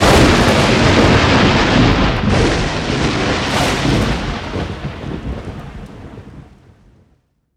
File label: ambience disaster electric boom